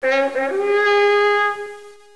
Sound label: horn; Caesar III